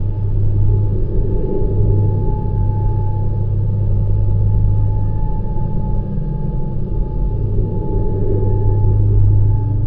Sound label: corridor, ambient atmosphere